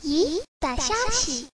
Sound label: notice